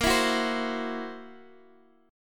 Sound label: F sharp 7th Flat 5th, chord; x,x,8,9,7,8